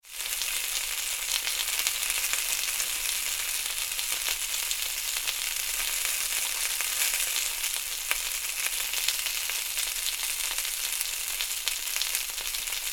Sausages Sizzling, Frying bangers in pan with fat spitting; Food; Household; Tom Hutchings